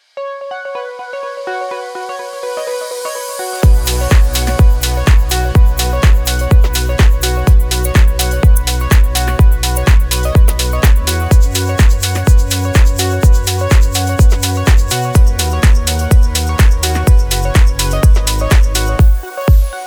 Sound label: Organic Shakers; Black Octopus Sound; Just Organic Shakers 2